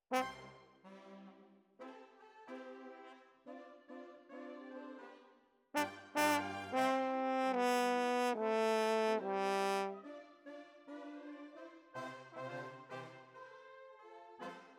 Horns

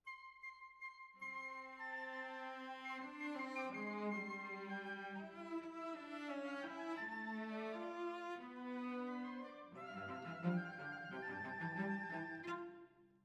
Cellos Over

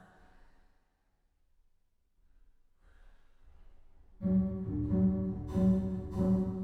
Bass

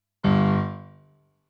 Piano, RNR for piano

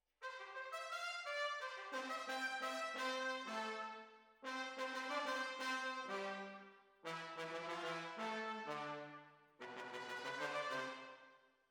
Tromb ASS

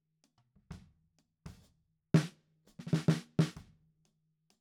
SNR Top

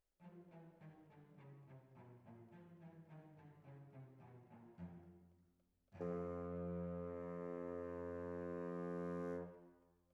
Oboe

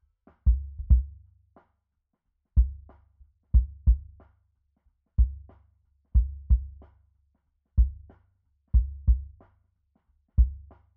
kick sub

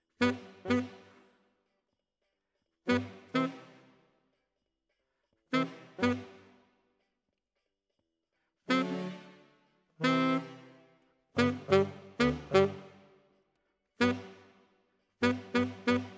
Alto, RNR for piano

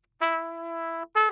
Trumpet

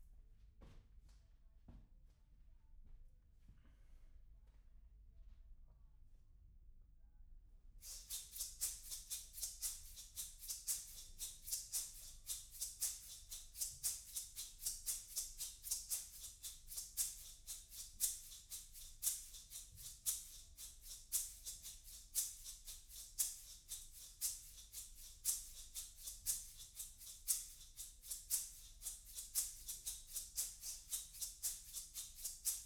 ROOM

